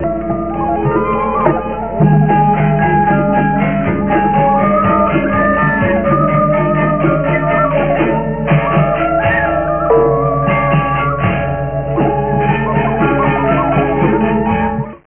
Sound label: legong